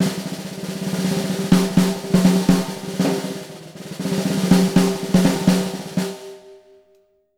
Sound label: 160 BRKBT; Univers Sons; Jazzistic